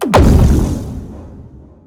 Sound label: atlas pulse; smite; god atlas